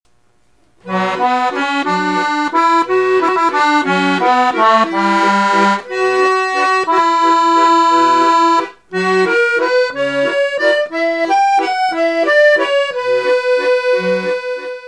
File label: Victoria MM